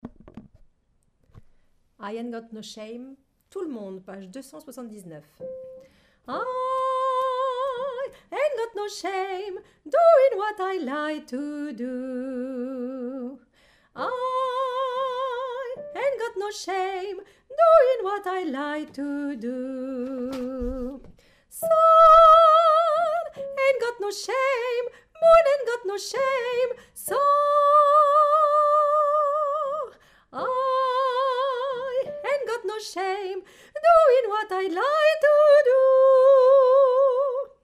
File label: Tutti